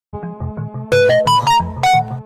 message Samsung

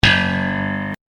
sitcom transition bass riff; seinfeld

